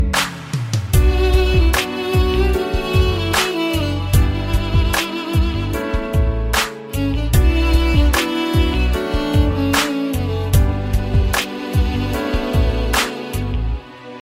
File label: Sad Violin